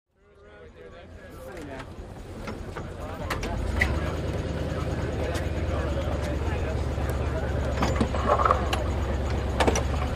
Boat Race Pit Doc Amb, motorboats

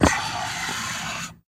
Pneumatic Door Mech

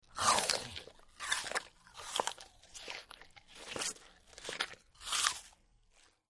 Bite Chew Apple Close

